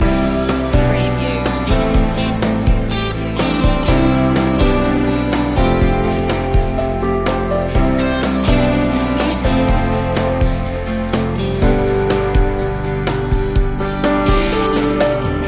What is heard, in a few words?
casual goings loop